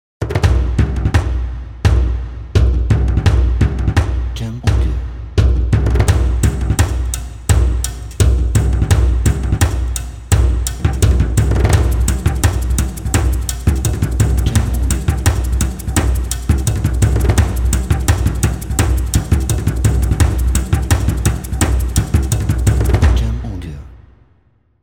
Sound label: Ritual Toms Intro